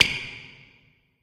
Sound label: Special Sneaker Squeak